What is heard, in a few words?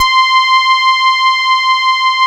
P800 PS C5, Korg POLY800; Back In Time Records; Korg Universe VOL-1